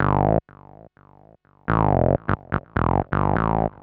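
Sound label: synth bass, house, 125, A; eJay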